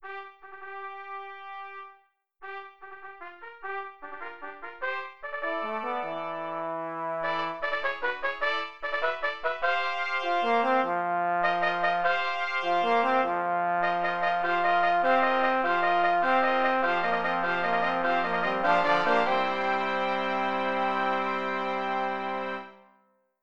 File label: Fanfare